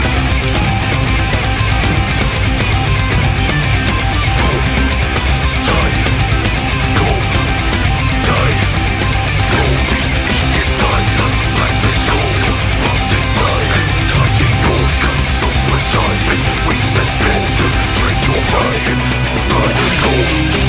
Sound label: Phone, ringtones